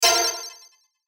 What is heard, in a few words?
button burst; Multimedia; Buttons